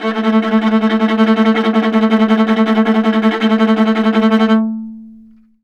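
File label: VIOLA tremolo A2; Orchestral; DirectWave; Varazdin Orchestral; Viola II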